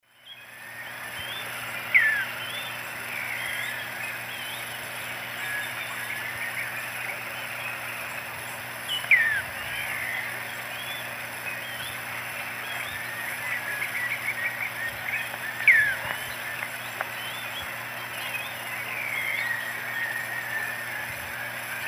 Elaenia spectabilis, call; S. America; Eastern Lowlands

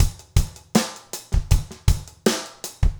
trip hop

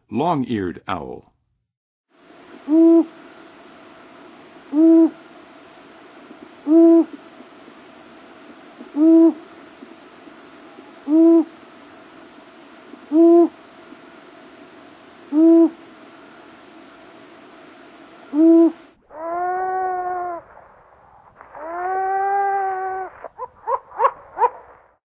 Long-eared Owl, Birds of North America; Western USA; Western USA Songbirds

